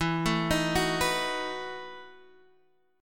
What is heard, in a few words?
E Major 7th Suspended 2nd Suspended 4th, Guitar, DADGAD